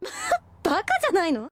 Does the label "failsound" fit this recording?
Yes